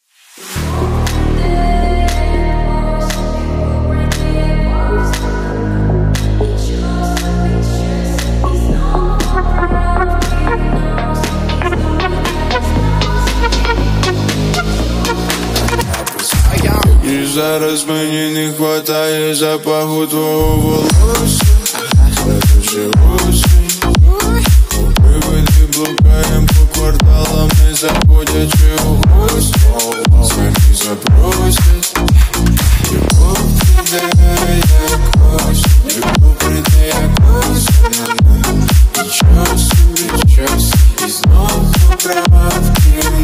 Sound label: slap house remix